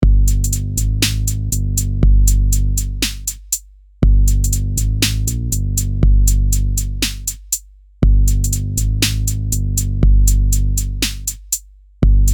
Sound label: Trap drum and bass loop